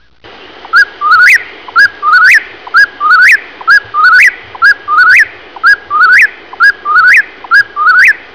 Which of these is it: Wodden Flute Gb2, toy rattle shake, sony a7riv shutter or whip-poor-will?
whip-poor-will